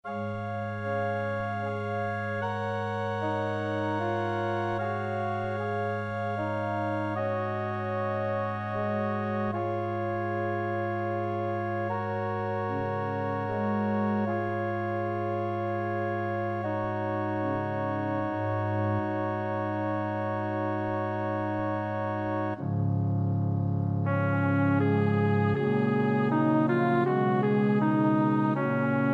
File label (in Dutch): bas; Kodály (Zoltán); Pange lingua